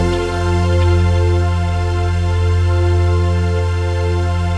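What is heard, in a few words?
chord pad, Pads; Best Service; XX Large - Pads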